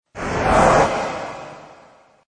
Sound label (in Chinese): 呼啸声慢; OvertakingLegend